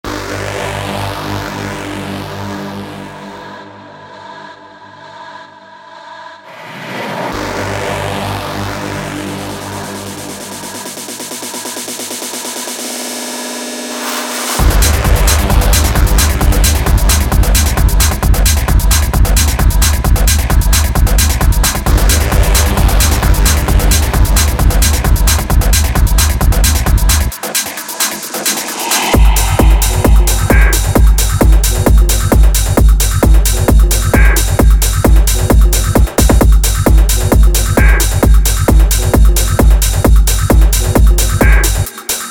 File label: techno tools